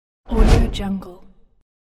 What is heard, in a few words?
whoosh